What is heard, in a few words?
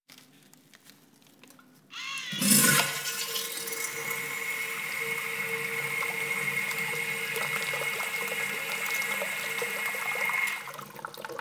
drum sound; manodrum